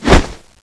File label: shove slash